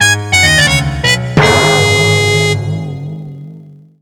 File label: Jingle ~ Uh-oh!; mario super sluggers; wii